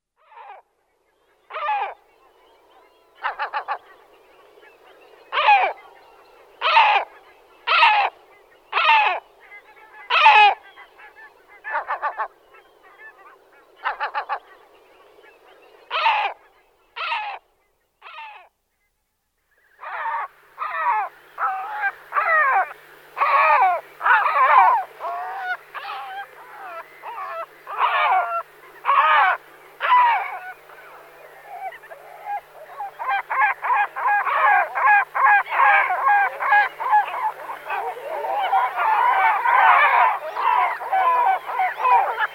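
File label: great black-backed gull voice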